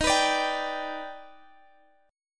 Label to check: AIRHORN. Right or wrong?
Wrong